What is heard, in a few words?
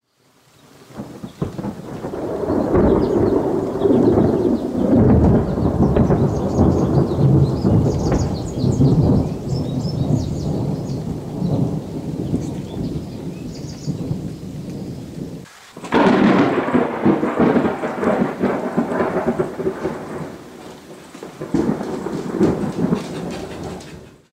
thunder